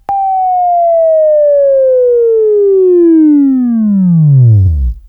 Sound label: WAV Left, Sweeping; Labview-Program; Sweeping Training; Buzz